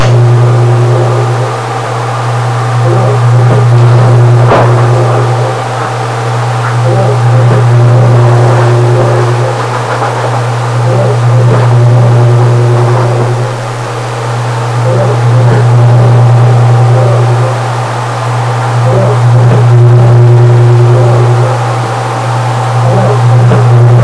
isochronic tone with embedded beat spacial projection